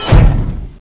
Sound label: Clock Tick